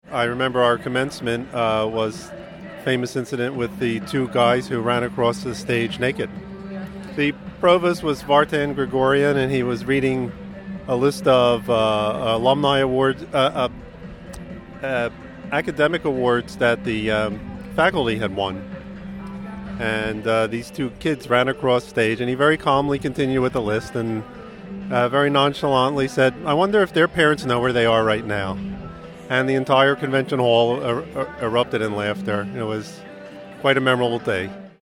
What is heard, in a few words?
bass